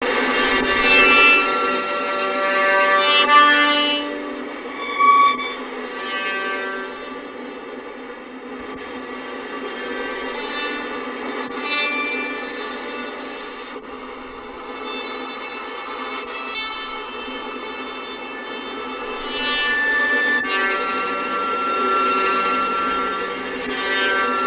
Violin Extended Technique

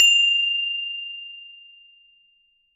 Hole Saw; Hole Saw Bits

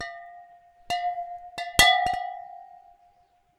Water Clink, Glass Clink In Water

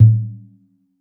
Def Deep, Def Drum; Percussion Samples

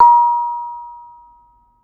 Bonang, Gamelan

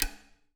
Classical Guitar Damped Pluck

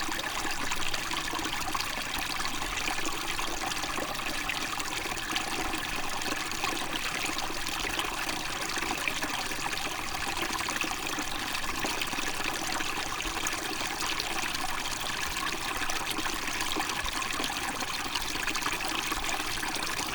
River, Static Microphone

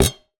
Ricochet, vase

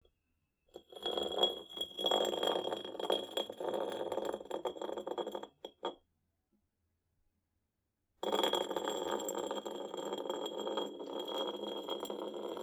Bottle Rattle And Roll; Bottles

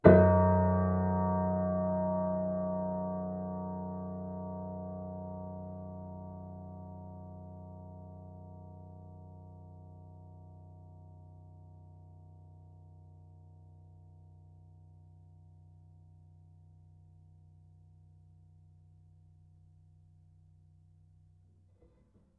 Piano Harmonics, Harm Low Mid

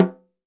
Snare Drum; Percussion Samples

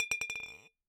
Bottle Struck

